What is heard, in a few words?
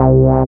SYN 202, MC-202; Sound & Vision - Gigapack I CD 2